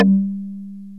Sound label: BOTL MARIMBA; OMI - Universe of Sounds